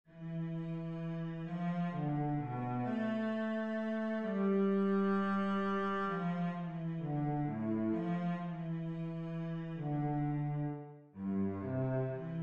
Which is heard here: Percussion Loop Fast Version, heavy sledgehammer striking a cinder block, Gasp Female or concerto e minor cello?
concerto e minor cello